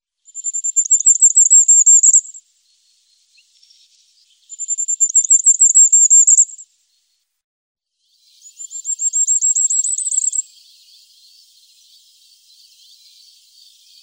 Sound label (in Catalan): bruel, Cants